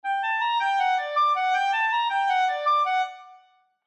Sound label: Clarinet; examples in treble clef